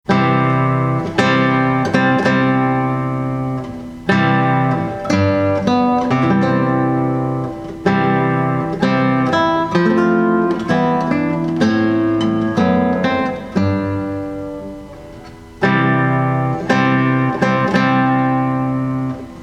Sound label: Traditional Wedding March, Classical Guitar; Bridal Chorus